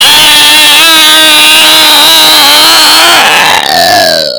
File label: real man screaming